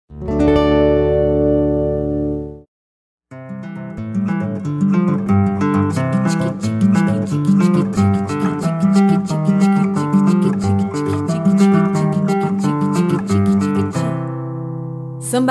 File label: Samba Lelê; MPBaby Clipes Animados 2